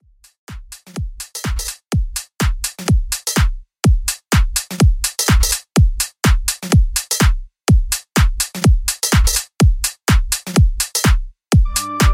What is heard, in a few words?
Club Redrum 125Bpm Clean